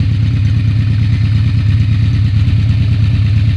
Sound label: bike engine